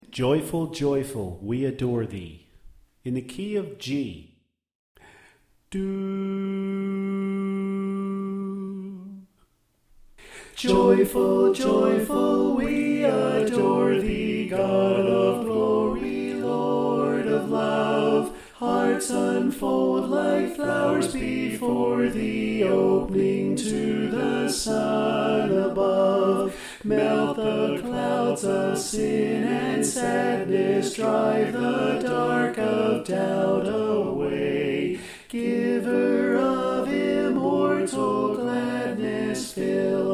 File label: Soprano Left